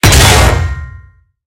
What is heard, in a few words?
tank hit med unpierce; tanks; War Thunder